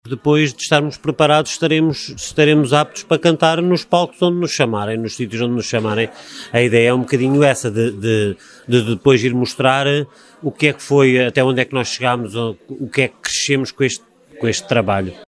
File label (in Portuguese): CORO